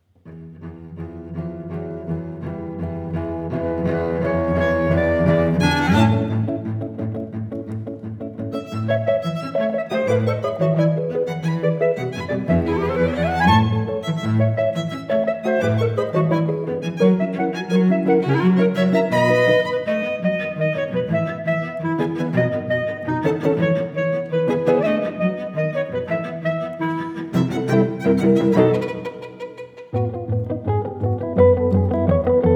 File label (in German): Polka; Tritsch Tratsch Polka Strauß Sohn